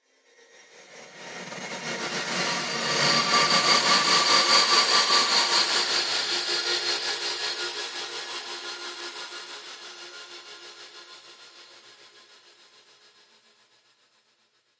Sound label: Horror Pad; Scary Soundscape Sound Effects